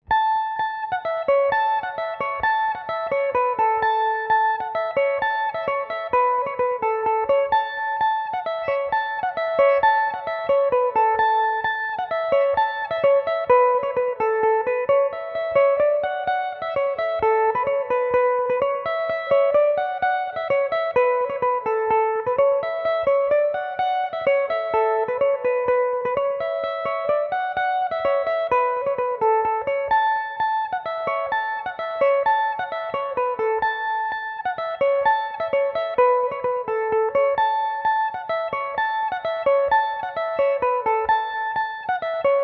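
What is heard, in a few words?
A major, Irish mandolin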